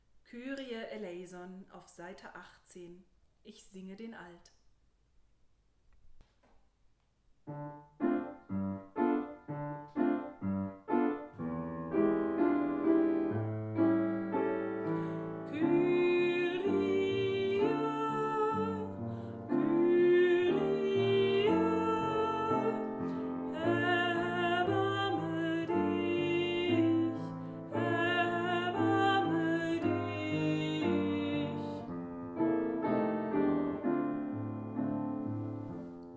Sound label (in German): Kyrie vierstg Alt; Chorfest der EKM auf der BUGA am 26.06.2021